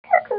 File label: cookoo